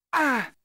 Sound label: punched; RedDot